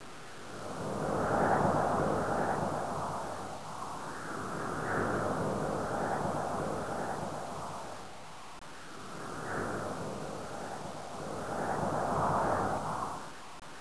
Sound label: wind